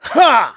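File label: Vox Attack, Korean; KaselB; kingsraid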